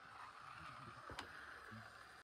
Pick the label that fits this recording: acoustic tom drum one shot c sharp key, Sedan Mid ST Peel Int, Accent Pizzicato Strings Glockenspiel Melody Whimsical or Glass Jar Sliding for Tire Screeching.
Glass Jar Sliding for Tire Screeching